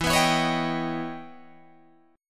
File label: E Minor Major 13th E Guitar DADGAD; EmM13; strummed; 14,14,14,18,18,17